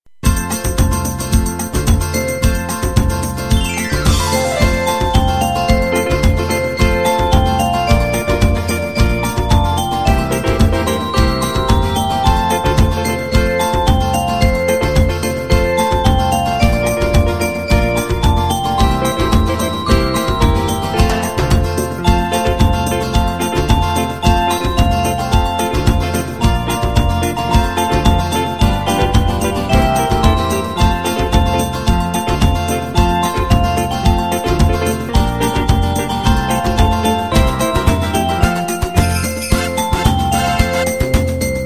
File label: jingle bells; Christmas